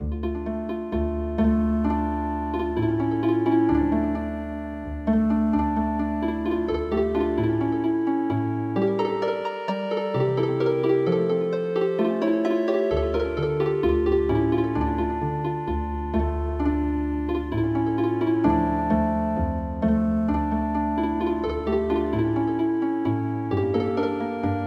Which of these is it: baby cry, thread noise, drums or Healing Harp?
Healing Harp